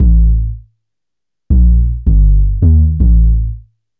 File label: Bass, Futurist Drum & Bass